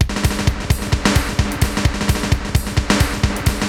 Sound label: Space Echo HR-16B 130BPM